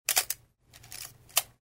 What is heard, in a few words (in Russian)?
zvuk camera